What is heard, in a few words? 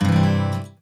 Guitar Confirm; the princess and the frog; Swamp; Music Ray